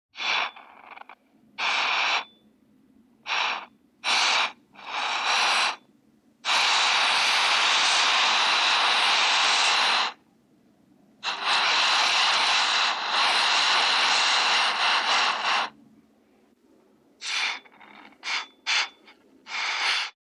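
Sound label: Payalnik, soldering iron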